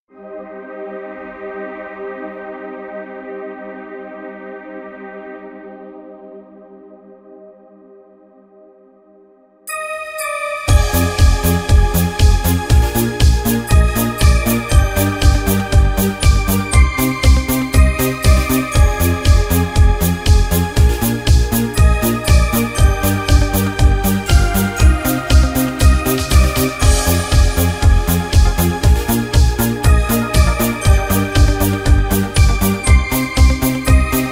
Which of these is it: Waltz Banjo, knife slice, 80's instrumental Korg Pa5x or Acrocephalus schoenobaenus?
80's instrumental Korg Pa5x